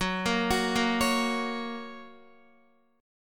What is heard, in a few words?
F sharp Major, Guitar; arpeggiated; 4F,4A,5C,5G,5C,6E; x,9,10,11,10,9; JGuitar